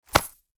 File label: Money pouch hand catch; purse